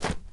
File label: run, elite footsteps, dirt